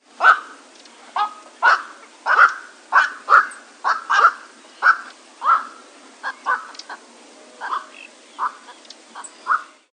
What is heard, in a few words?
Nycticorax nycticorax, Garza bruja